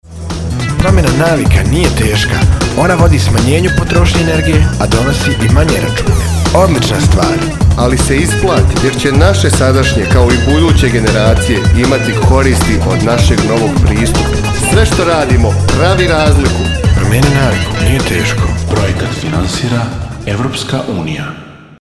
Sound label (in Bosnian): JINGL